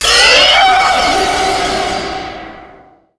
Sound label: falling; Quake III Arena